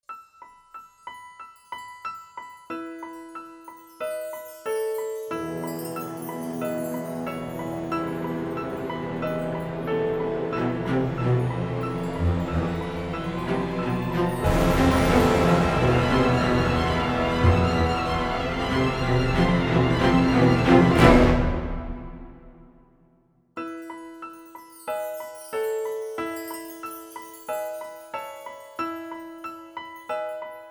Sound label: Epilogue music